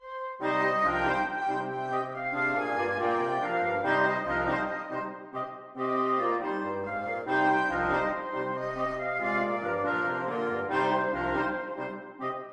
wind quintet; emmanuel rock

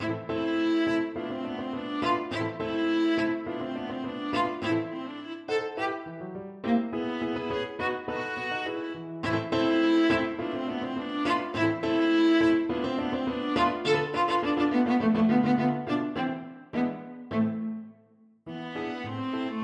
bohemienne, viola

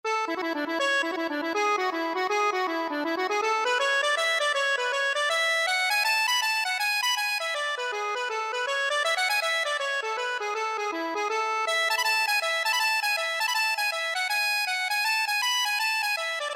johnnys welcome, accordion

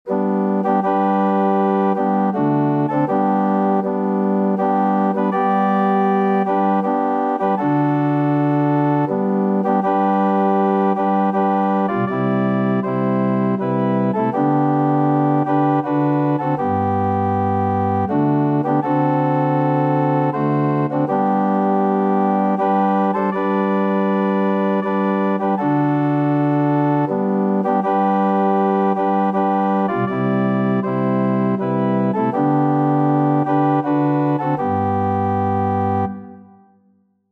ORG, organ

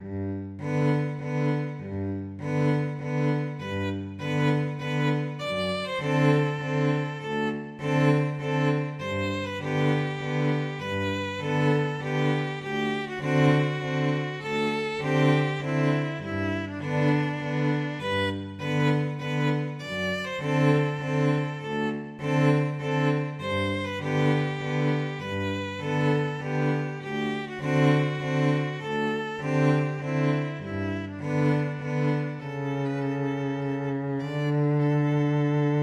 violin cello duet

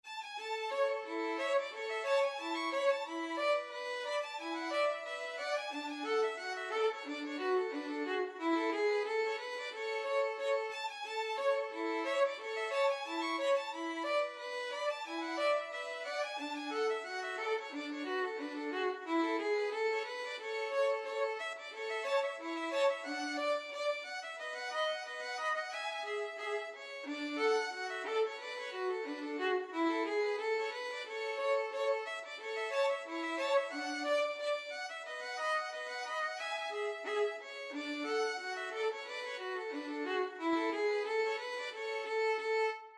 sailors hornpipe, violin duet

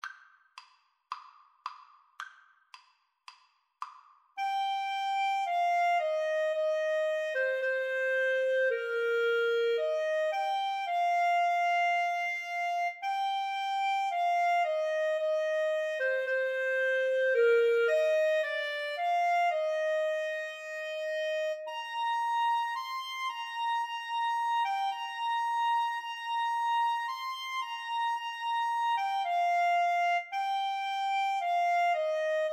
brass, clarinet saxophone duet

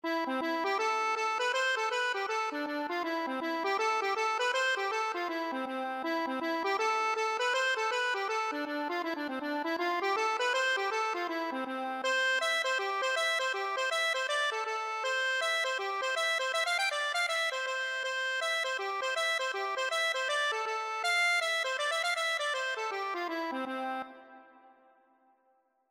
reel, accordion; craigs

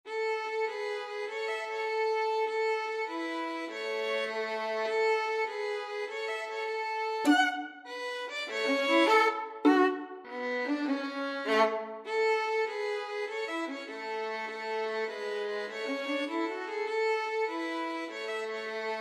pop goes, violin duet

